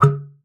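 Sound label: C#4, bass flute; Xsample VOL-2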